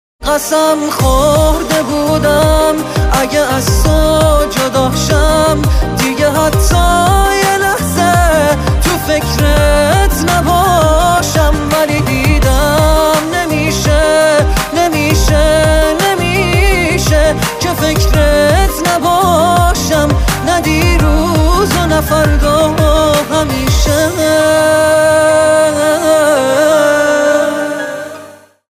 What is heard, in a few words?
ringtone; پاپ